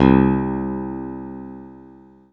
PICK C3; Greytsounds; Scott Peers Sound Engineering

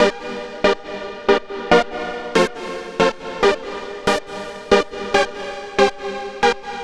Unison Seq; Dr. Fink's Funk Factory

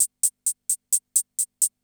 CR78

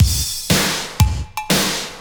120 SOUL, DRUMS; Best Service Advanced Media Trax Modern Composer

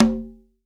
CONGA; STEREO CONGA; Cuba

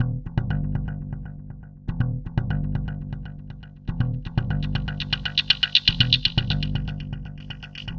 Processed Electric Bass

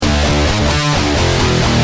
G Pump, Guitar; Industrial

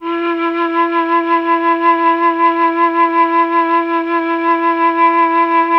Melflue4, MelloFlute; Oldies

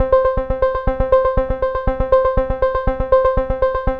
Auto Arpeggio; Dr. Fink's Funk Factory

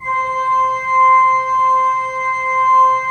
3D Pipe Mix Celeste, 3D Organs

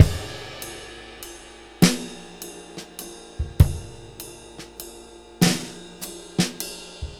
6/8 Loop, Drum Tools, Laid Back

